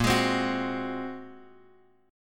A sharp 7th Suspended 2nd Sharp 5th, Guitar, Drop D; 8,11,10,11,x,x; down